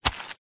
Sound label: footstep single walking wet forest floor; foley; zapsplat